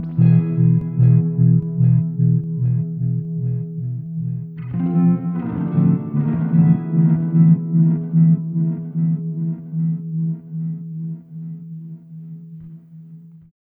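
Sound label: Rhythm Guitars, E; Zero-G Kocktail Kollection; Construction Kitz (Lounge); Volcano at Sunrise 074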